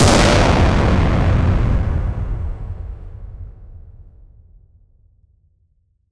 atomic bomb explosion

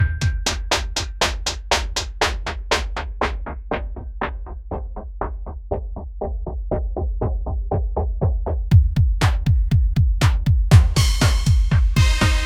asian ambient house drums

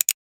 generate a realistic keys, short decay

